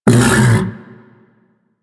Vocal Tranq Grunt, critter; Warframe; Hunting Critters; Undazoa

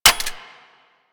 Pistol Mech; Kohm

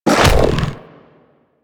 Hoverboard Jump; Warframe; Vehicles; Venus; anim sounds

